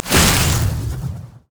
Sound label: frost scorched earth; LoL; shyvana